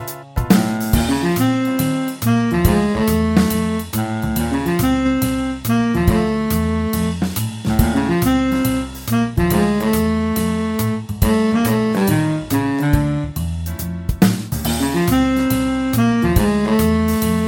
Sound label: baritone saxophone